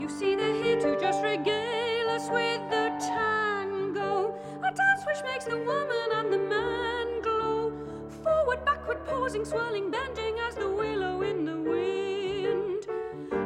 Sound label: Theme on a Russian Tango